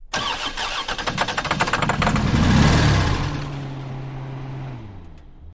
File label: Car; False Starts, Short, Medium And Long Attempts With Starter Burns